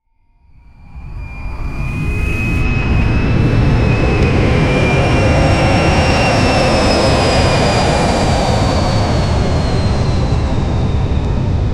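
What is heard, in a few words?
powerful large jet airplane, ascend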